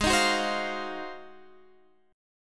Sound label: G sharp 13th G sharp, down, Guitar, Standard; strummed; JGuitar